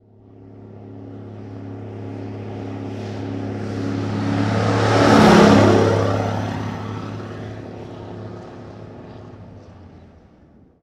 1954 Big Rig Ext Fast By; Period Vehicles

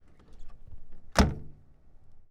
1929 Sedan Door Open Close; Period Vehicles

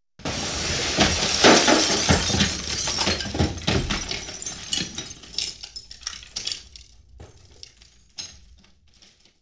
Explosion; Explosions - 5.1 Surround

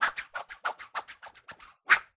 Sword Movement Multiple

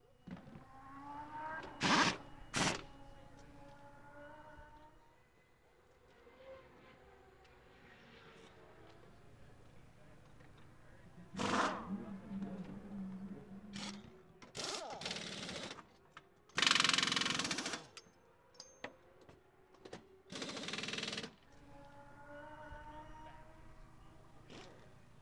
Race Track Pit Crew

